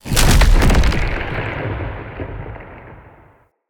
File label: Lightning Blast Mark; Characters; Enemy; Abilities; Nimrod